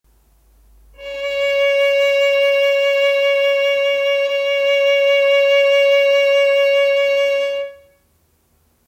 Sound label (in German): Vibrato Ton Cis mit Vibrato